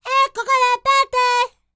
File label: Vocal Planet World Voices, NOMADIC